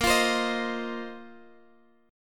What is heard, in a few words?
A sharp Suspended 2nd Suspended 4th A sharp, Guitar, Open G